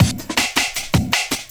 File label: LOOP L, Drum & Bass 160 BPM